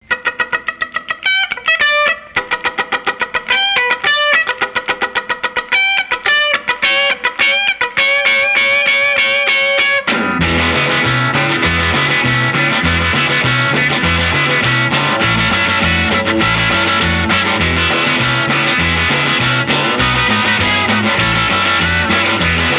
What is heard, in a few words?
guitar